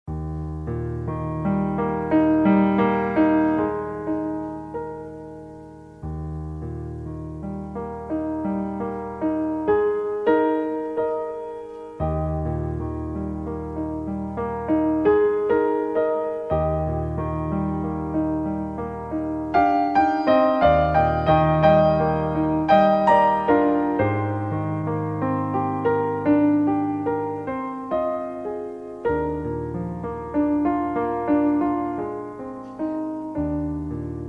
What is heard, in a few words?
piano solo